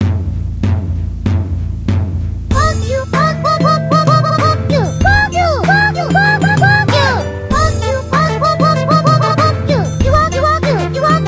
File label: beat; sven